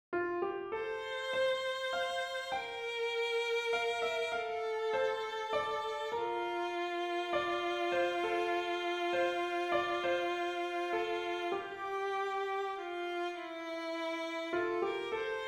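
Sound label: melody with harmony; Papas Journey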